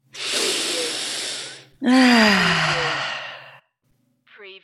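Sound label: Female Satisfied Sigh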